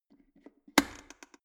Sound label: plastic water boiler open lid fast; maschinen; wasserkocher